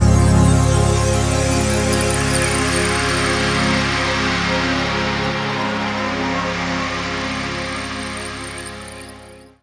Pad, Jungle; Pads; Blu Mar Ten; Jungle - 1989 to 1999

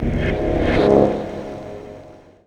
Mute Hit; Jungle; Blu Mar Ten - Jungle Jungle - 1989 to 1999 Samplepack

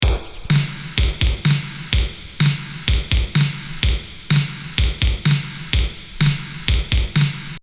Upbeat Groove (127); CRATES & BREAKS VOL.1 - BEEJ; DRUM GROOVES

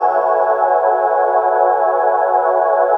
Pads; Jungle; 11A; Blu Mar Ten; Jungle - 1989 to 1999

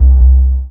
808 - 5A, Bass; Jungle; Blu Mar Ten; Jungle - 1989 to 1999

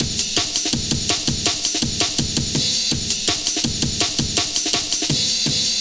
Rise Amen, Jungle; Breaks; Blu Mar Ten - Jungle Jungle - 1989 to 1999 Samplepack

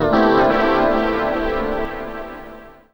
Synth; Blu Mar Ten; Jungle Jungle - 1989 to 1999 Samplepack

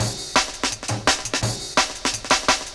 Tear Break, Jungle; Breaks; Blu Mar Ten - Jungle Jungle - 1989 to 1999 Samplepack

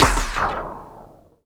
Hit; Blu Mar Ten; Jungle Jungle - 1989 to 1999 Samplepack

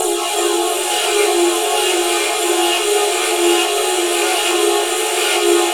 Metropolis Pad; Jungle; Pads; Blu Mar Ten; Jungle - 1989 to 1999

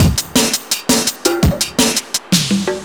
Vapour Break; Jungle; Breaks; Blu Mar Ten - Jungle Jungle - 1989 to 1999 Samplepack

